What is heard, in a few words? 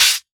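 Hat Open Midrange; Drum Hits; Nu Dance Electro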